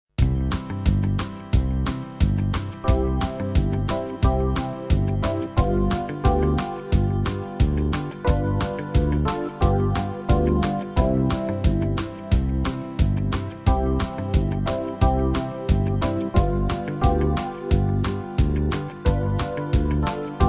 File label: E-Piano; The LORD God is a sun; Country Hits